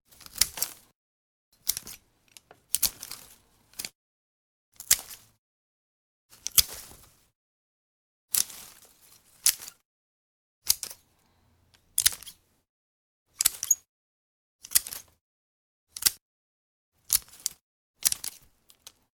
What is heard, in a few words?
Pruning sounds with garden Secateurs; Hand Tools